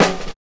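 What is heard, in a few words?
snare drum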